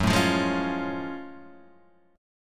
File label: F sharp Minor Major 9th F sharp; strummed